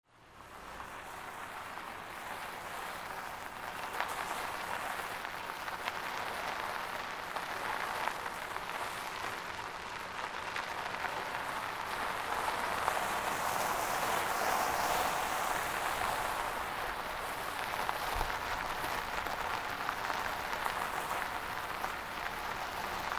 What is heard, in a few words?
AMBIENCE